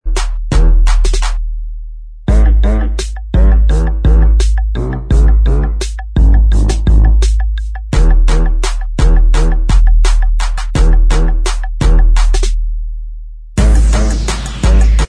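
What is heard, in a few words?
Instrumental Track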